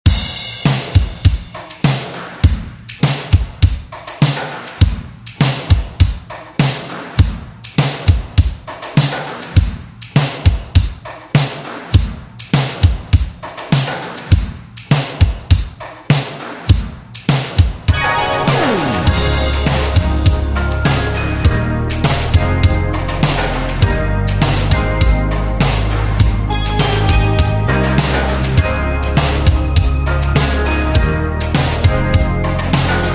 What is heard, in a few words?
80s Re Drum; DJ Mhark